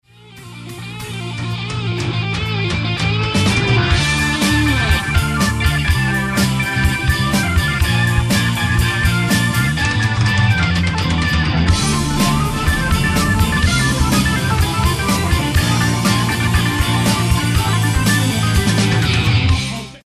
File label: lead sample Hammond M102